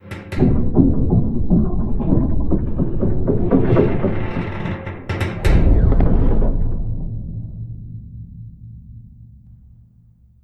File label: Magnet Up; ttt foundation; scps